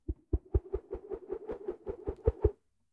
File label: Swirl Swoosh Fluctuating; Whooshes